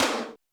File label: Tom Hi Thrash, Alesis D4